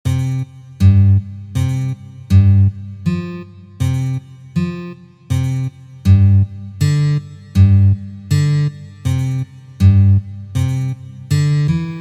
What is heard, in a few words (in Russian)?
CHereduyushhijsya bas